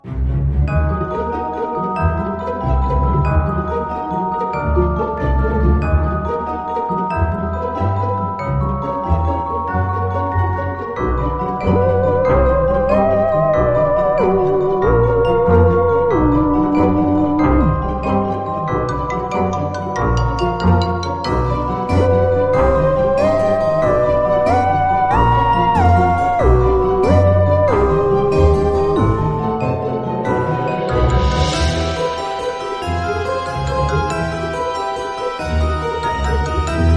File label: BG Theme; JumpinJack